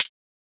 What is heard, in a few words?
Closed Hat; FLPRO